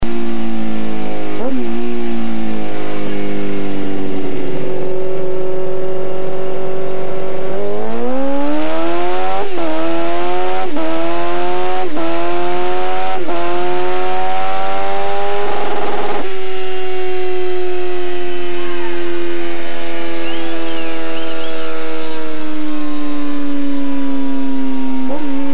Aprilia RSV Mille R muzzy pipe

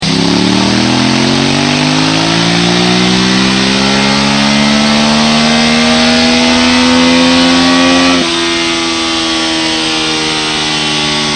ducati monster

